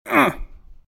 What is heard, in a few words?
hurt; RadwinIsland